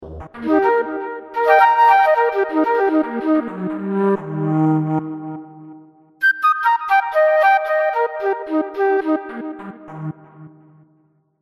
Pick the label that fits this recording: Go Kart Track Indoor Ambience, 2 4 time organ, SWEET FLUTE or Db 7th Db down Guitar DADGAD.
SWEET FLUTE